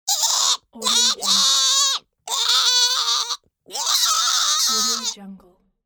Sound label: cartoon dinosaur bird squeaks